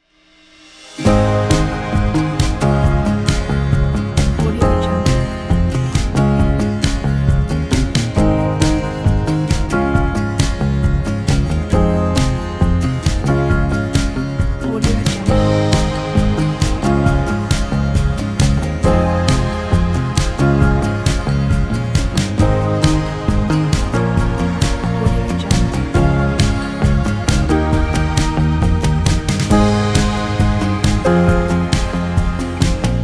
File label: relaxed acoustic summer fun